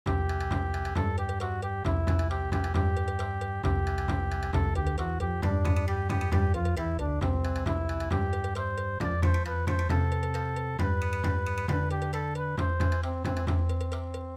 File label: Bon Odori, midi; Head Shoulders Knees Toes